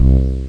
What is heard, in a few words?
Fretless Bass (C1); Patches; X-Wing